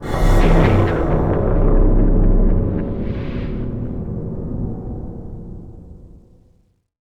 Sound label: graveyard stinger